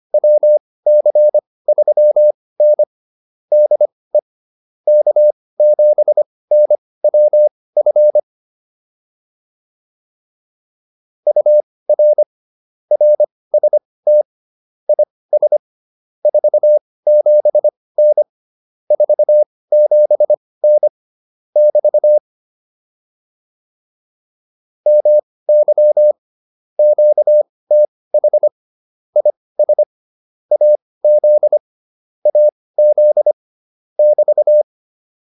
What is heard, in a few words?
QSO, intermediate practice files